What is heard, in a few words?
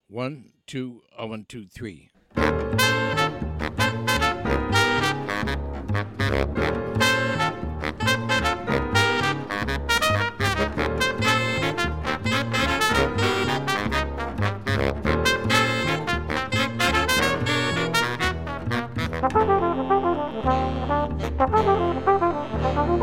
minus drums